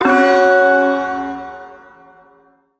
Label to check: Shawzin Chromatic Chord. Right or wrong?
Right